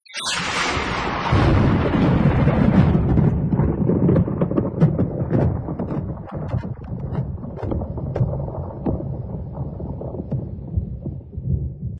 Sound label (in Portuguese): Trovao, Vinheta